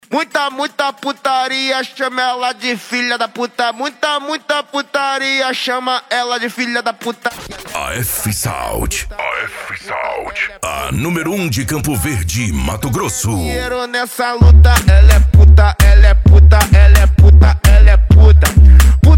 esp funk automotivo; afsound

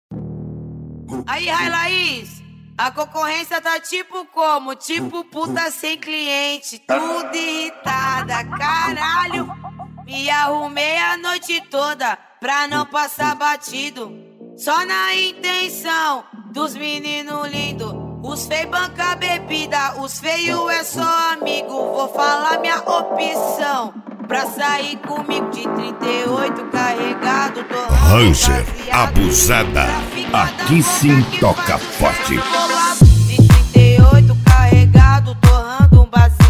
mega funk

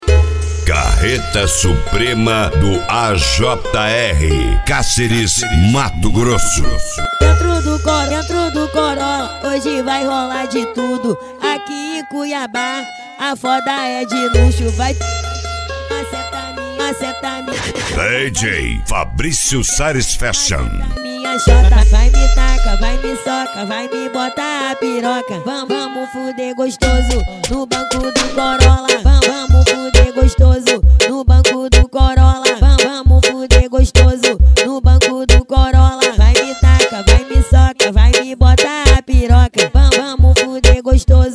carreta suprema funk

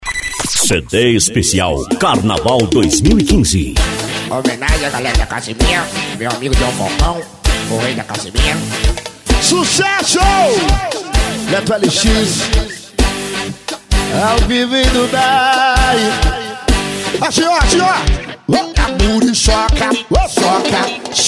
pagodao funk

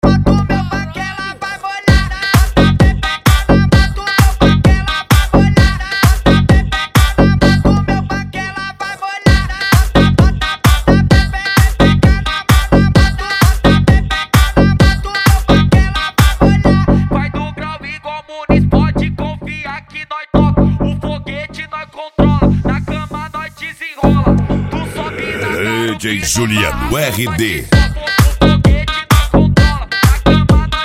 mega funk

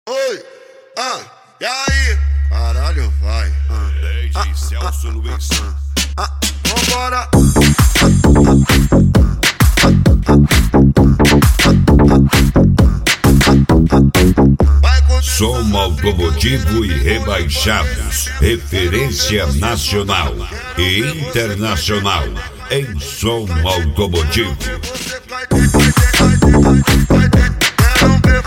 som automotivo; rebaixados 2023

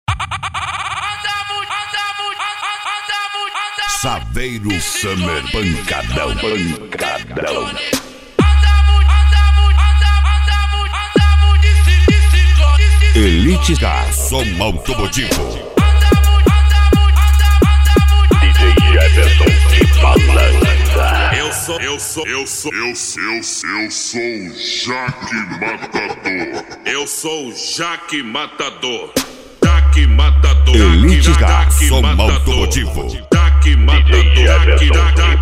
som automotivo; elite car

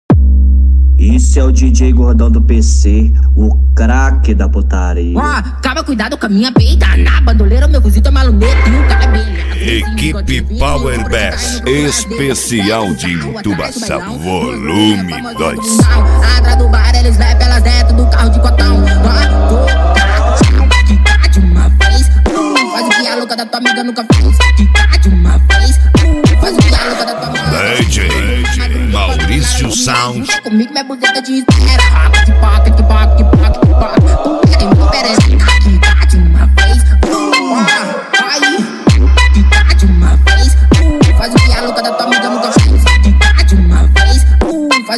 entubacao

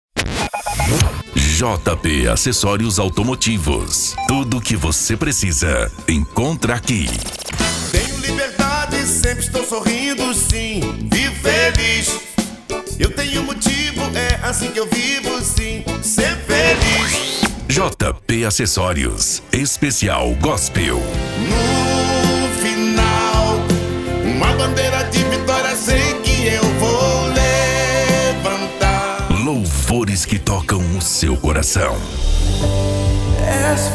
jp acessorios automotivos esp gospel

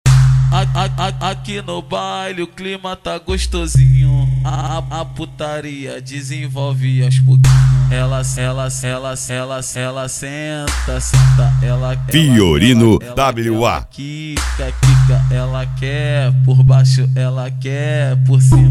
funk bass sertanejo

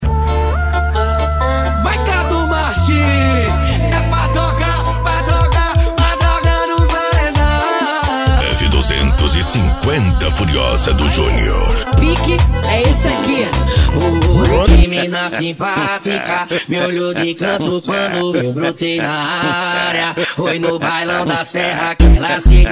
funk e sertanejo

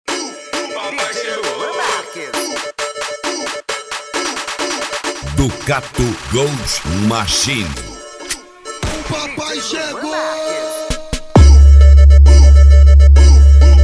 especial funk

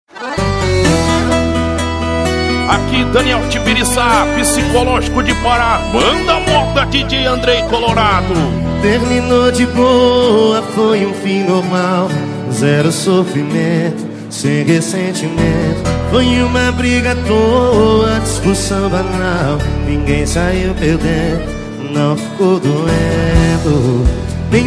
sertanejo com locutores de rodeio

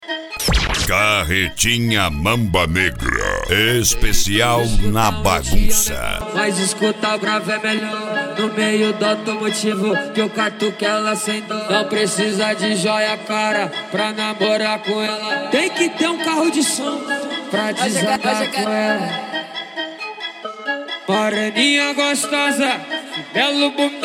carretinha mamba negra esp funk ritmado; jr auto som

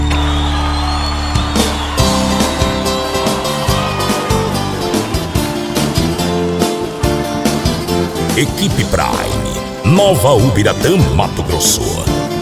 moda de viola; frequency mix

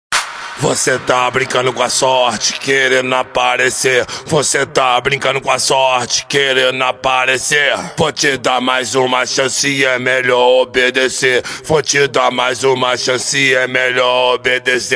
som automotivo; rebaixados

